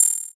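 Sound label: bell; suzu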